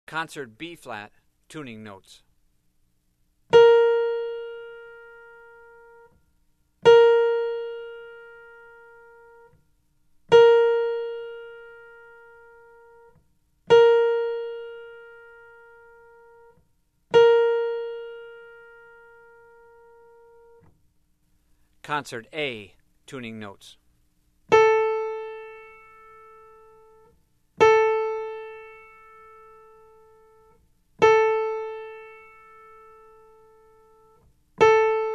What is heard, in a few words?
Tuning Notes; James „Jamey“ Aebersold Play-Alongs